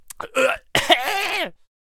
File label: Quick Draw Death Vocals